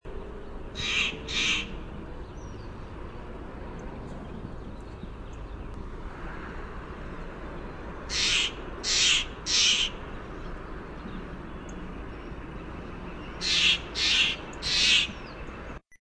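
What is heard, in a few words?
Steller's Jay guttural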